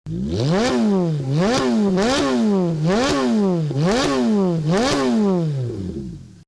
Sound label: f430 sound